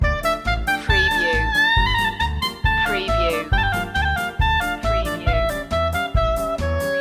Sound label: klezmer loop